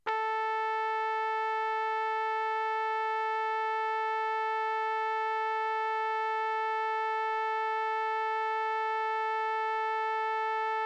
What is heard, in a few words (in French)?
Trompette; Le son - La musique